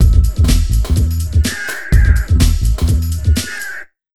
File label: BIG 125, Big Beat Drumloops; USB Soundscan vol.25; AKAI